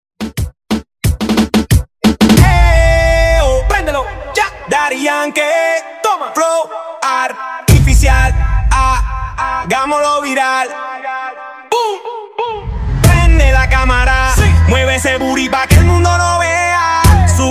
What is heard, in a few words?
Reggaeton Redrum